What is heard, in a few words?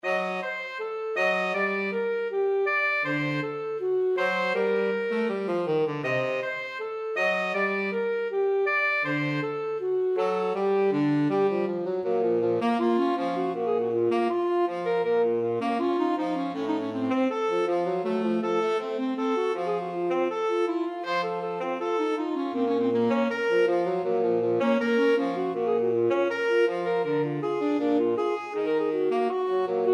alto sax tenor sax; el choclo